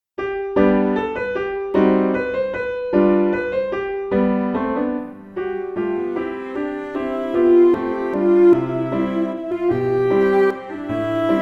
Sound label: mazurka; ronchini ferdinando miniatures